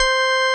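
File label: METAL ORGAN; Greytsounds; Scott Peers Sound Engineering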